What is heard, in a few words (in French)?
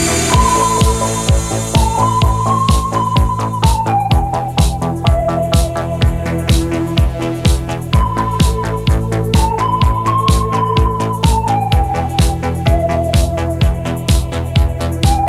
accords